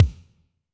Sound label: Kik Ambnt Elec, Drum Machines Alesis D4